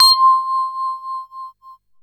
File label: BELL; CMP - Analogon